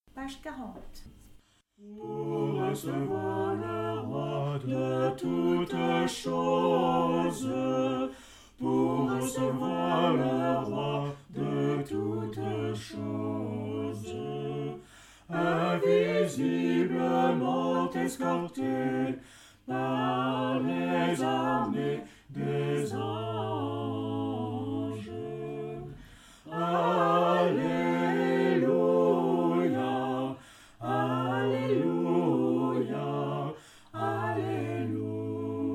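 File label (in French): Litanie Achevons notre prière devant le Seigneur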